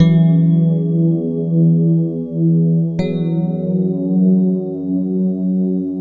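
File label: Electric Piano; Keys; Keyboards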